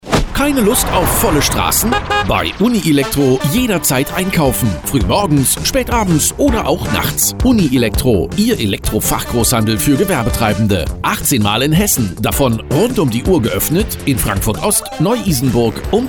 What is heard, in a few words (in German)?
Strassen; Uni-Elektro Hessen; Nachtaktiv 24-7